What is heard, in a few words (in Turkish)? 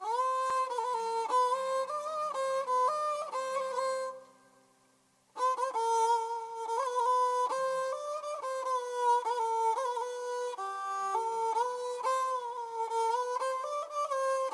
Kemence Kanun Mahur Taksim; Istanbul Tasavvuf Irfan ve Meydan Meskleri Toplulugu